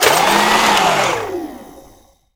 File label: Household Items All Together